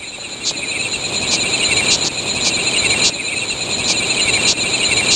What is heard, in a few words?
Hot Field Crickets